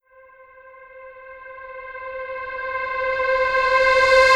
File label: reverse, vocal sample